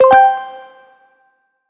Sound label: correct